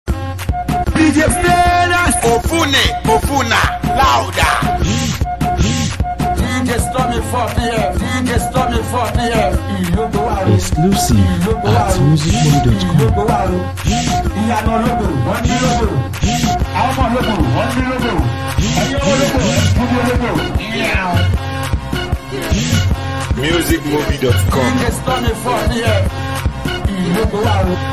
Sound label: Mara Beat